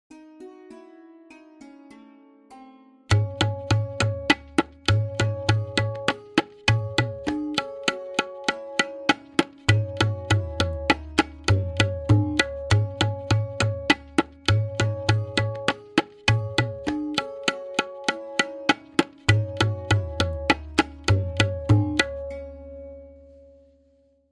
Variation 7-Played with Lehra, Benares Kaida 1, Tintal; Benares Kayda 1